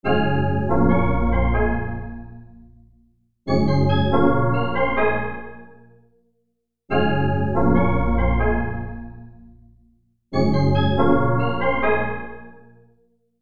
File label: Luxury Sample